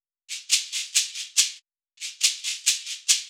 drum Loop 140BPM Shaker; Vengeance - Essential Clubsounds Vol.5; VEC5 Drumloops; VEC5 Multi Loops 140BPM

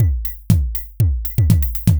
beat Fill; Anabeat; Sonic Foundry (Sony Creative Software); Prototechno

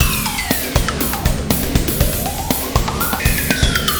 120 POWER, drums

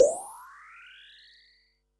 Perc, Percussion and FX; Pearl SC-40

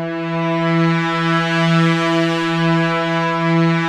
Prophet 5, E4; Hollywood Edge - Giorgio Moroder Rare Synthesizer Collection

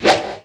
WHIP; Crypton; DrumBase5000

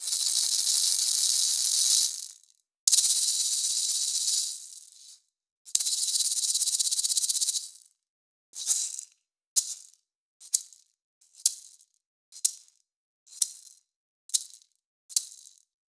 Raw Perc Maracas

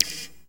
ZAP HAT; Techno Trance; Perc; E-MU Formula 4000 Series Vol. 2; OrbitPercMaster

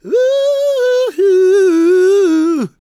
GOSP MALE, SOLO; Vocal Planet Vol1 Gospel

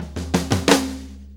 4-4 Fill, Drum Loops

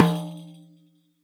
RIQ; SoundBytes - Early Patches

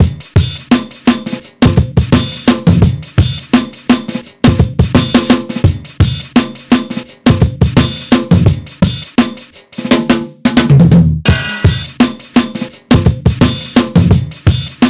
HIPHOP; INIS; Funky Xtreams

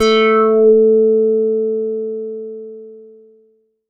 Rezzer a4, Korg Poly-800

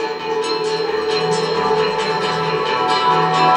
Fadein; Sonic Foundry (Sony Creative Software); Synchro Funk

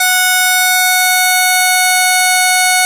ODYSSEY LEAD F#5, Vintage Keyboards; Big Fish Audio - First Call Vintage Keyboards

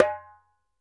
ASHIKO, African Drum

